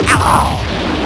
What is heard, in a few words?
aargh; WINFIGHT